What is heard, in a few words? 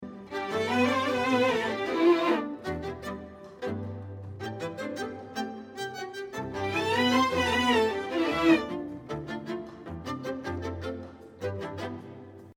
strings only